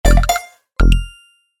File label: kawaii